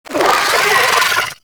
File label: Vocal Attack; Amalgam Moa Satyr